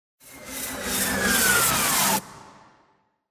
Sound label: RISING WIPE